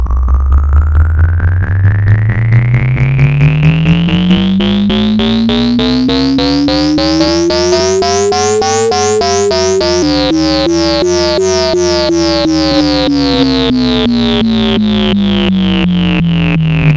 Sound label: Autoloop